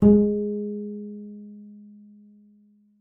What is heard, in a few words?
PIZM G#3, bass pizz; Xsample VOL-5